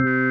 KEY, Org Ham; Club-50 - Foundations Roland; Organs

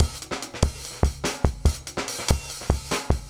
DRUM MUTATION, PROCESSED ACOUSTIC DRUM KIT; Sonic Foundry (Sony Creative Software); Vir Unis Infusion

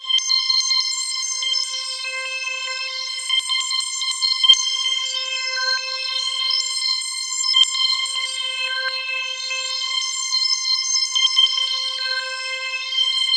C5, Roland JV 2080 TECHNO PAD

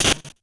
Bent Snare

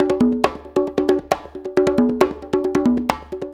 Congas, Perc Loops; Drum Loops; 4-4; Sonic Foundry; Drum Tools

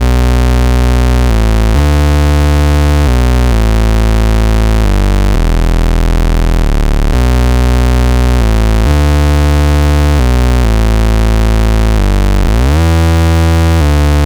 C64 Slide Bass, Commodore 64, Chiptune

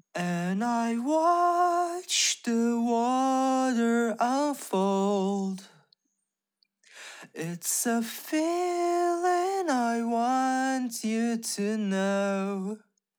attempting to sing, vocal

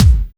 RAD KICK, TECHNO; Drums In Your FACE!

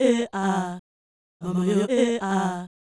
Fem Vocal 128bpm G FX, African Voices

